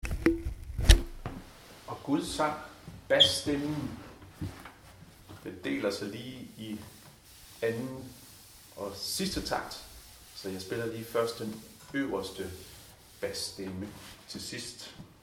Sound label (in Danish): Og gud sang tenor; harlev koret